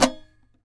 SAZ TAP; Spectrasonics; Hans Zimmer Guitars VOL-2

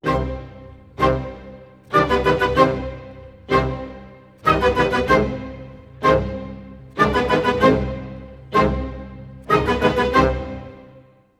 95 c min full mix, Orchestral arrangements

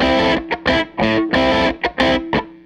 Les Guitar Loop; Sonic Foundry (Sony Creative Software) - Six-String Orchestra

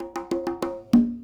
Congas, 70s Analog Funk

